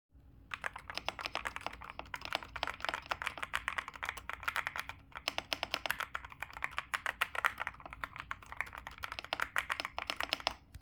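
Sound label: msi forge gk600 tkl wireless, keyboard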